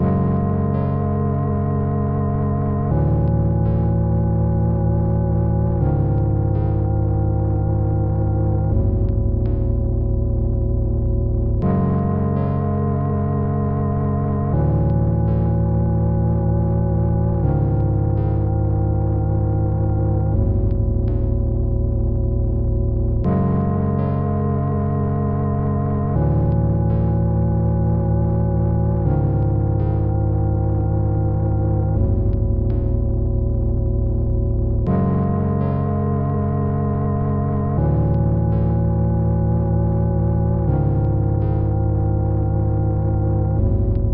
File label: stormclouds